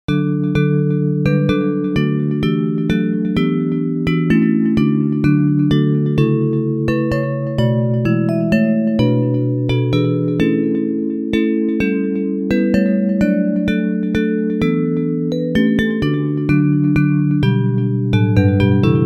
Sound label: Bells